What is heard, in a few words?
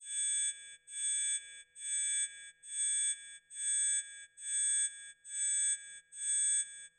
alarm